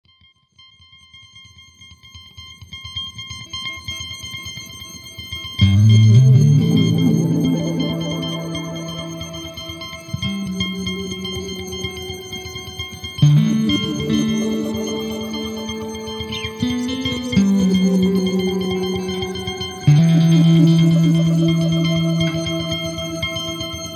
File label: guitar jam; basslab